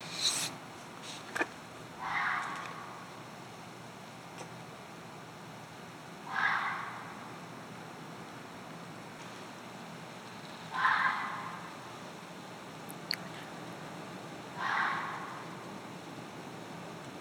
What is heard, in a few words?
animal sound